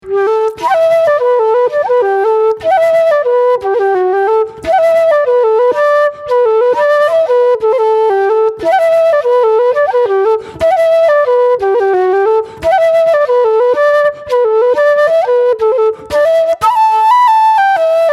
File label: New Slide; OKeefes New Slide